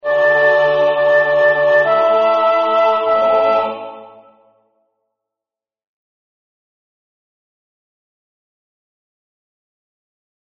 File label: Dona Nobis, Soprano; Zelenka; MissaPaschalis